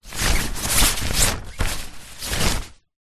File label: foley tent door canvas material movement; zapsplat